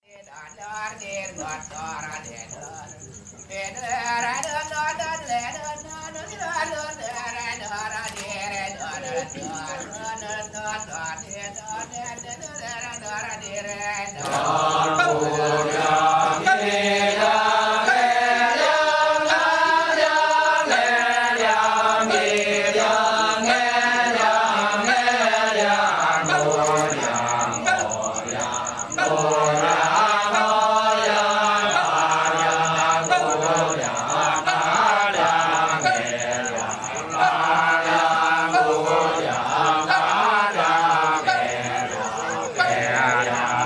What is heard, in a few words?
Kecak Ubud